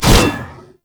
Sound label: Scythe Spin Whoosh, Weapons; Warframe; Stalker Scythe